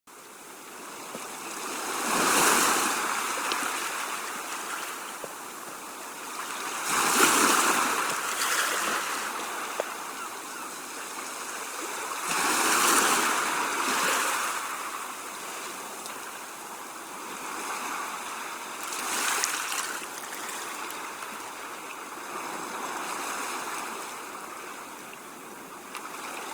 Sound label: sea ambience; dr2